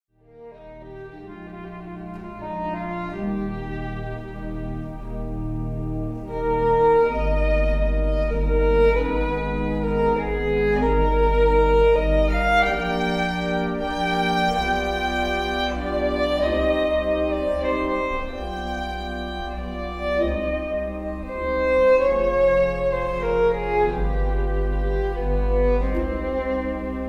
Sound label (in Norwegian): fiolin